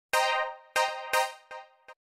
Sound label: flicker; huawei harmonyos